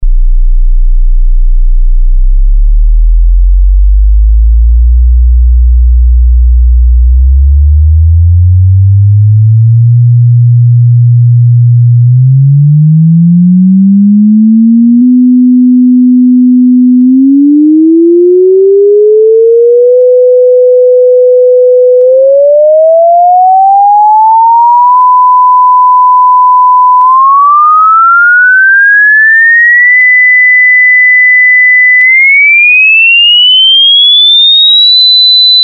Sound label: sweep